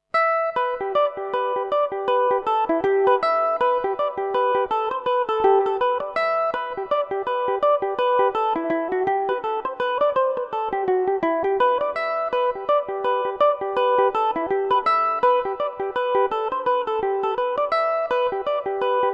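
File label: E minor revelation, irish mandolin; Eoin's Welcome; Revelation Jaguar electric mandolin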